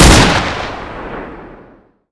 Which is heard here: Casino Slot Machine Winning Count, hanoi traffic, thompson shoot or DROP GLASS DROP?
thompson shoot